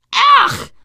reaction disgusted, teen girl, survivor voice; Left 4 Dead; Source Engine

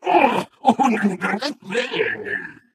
ballbot impressive

